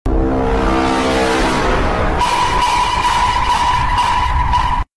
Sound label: cursed and bass boosted imposter sound; Voicemod; very sus